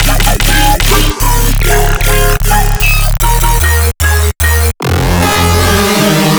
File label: some color bassish loop